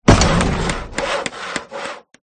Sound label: building start; sfgame